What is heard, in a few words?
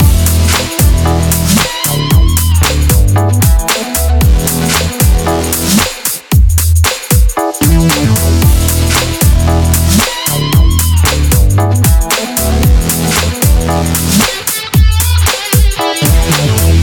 emotes lanky legs loop; fortnite; athena